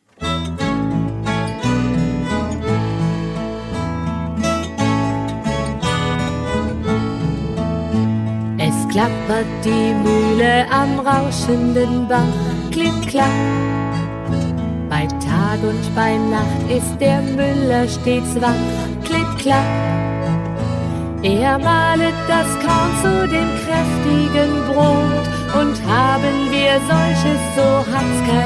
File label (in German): Es klappert die Muehle am rauschenden Bach